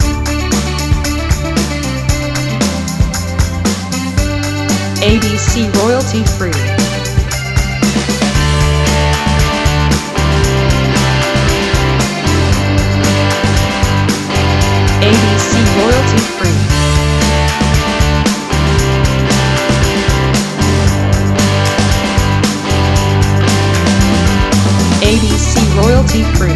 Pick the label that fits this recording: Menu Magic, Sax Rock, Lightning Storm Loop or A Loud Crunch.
Sax Rock